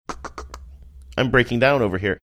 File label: making mouth clicking sounds saying im breaking down over here